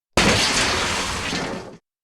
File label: glass windows crashing